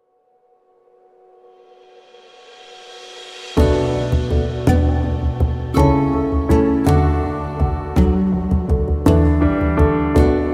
Engellied Playback

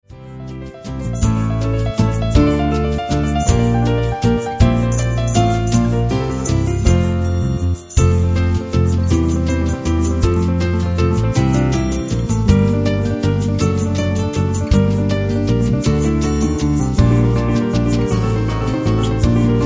Kleines Praeludium in F-Dur

